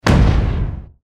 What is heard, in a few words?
explosion